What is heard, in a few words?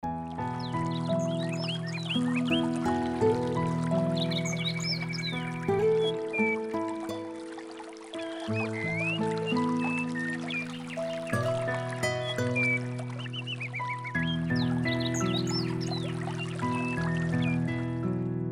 Alarm; Coolpad ivvi K1 mini